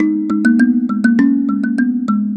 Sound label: Mbira Low; Melodic; Ambient-Melodics-II